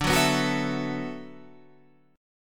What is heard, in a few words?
D 6th Add 9th D, Guitar, Standard; D6add9 strummed; JGuitar